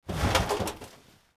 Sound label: fatman unequip, weapons reload